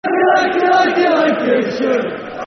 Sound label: Lancashire [Football Chant (Soccer Songs)], Oldham; Oldham Athletic Oafc